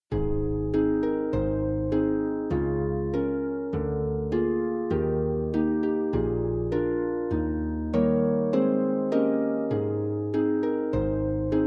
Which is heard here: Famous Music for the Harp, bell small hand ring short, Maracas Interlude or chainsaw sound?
Famous Music for the Harp